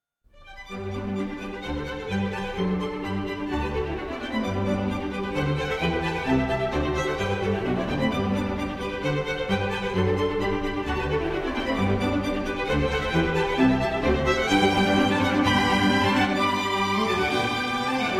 classical

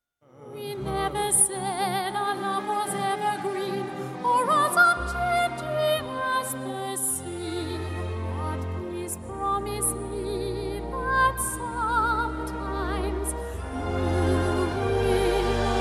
soprano

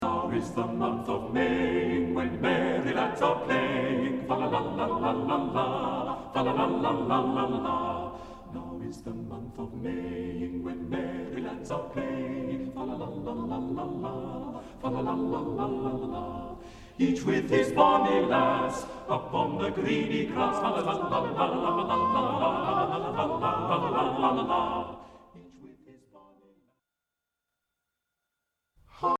ballett

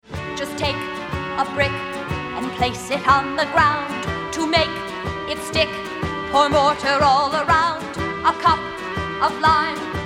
homophony

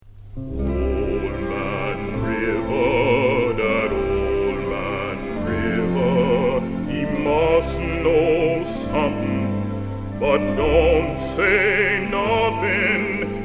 bass

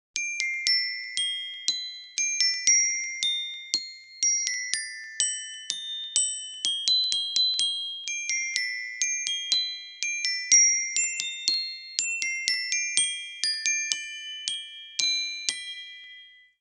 glockenspiel

